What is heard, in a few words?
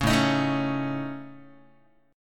A Major Flat 5th, Guitar, AMb5 chord; strummed; JGuitar